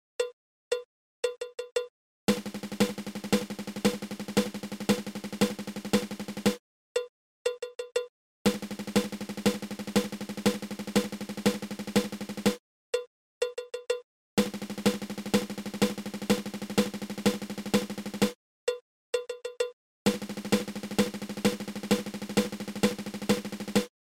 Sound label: Paradiddle diddle